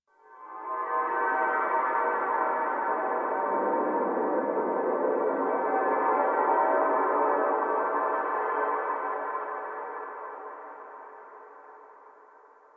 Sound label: Church Bell; Dagath; Warframe; Ambience; Clan Dojo; Dojo Misc